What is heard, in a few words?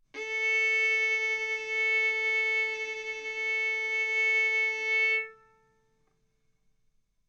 Violin arco mf sul A A4 B4 stereo